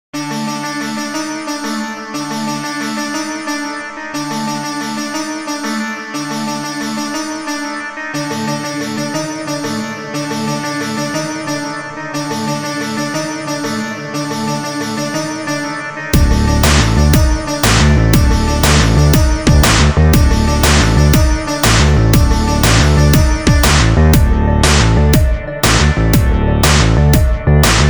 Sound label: creepy forest